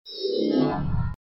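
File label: space appearance; Короткие звуки для монтажа; transition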